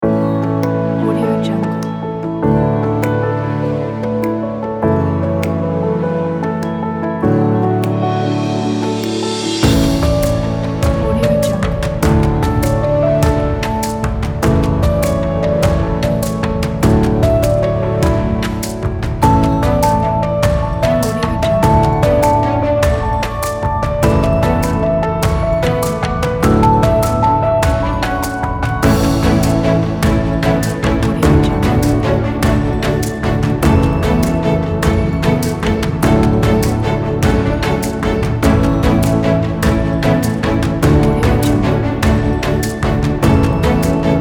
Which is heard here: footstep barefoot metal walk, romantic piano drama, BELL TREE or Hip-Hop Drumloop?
romantic piano drama